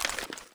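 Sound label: sg556 zoom out, gun; arccw go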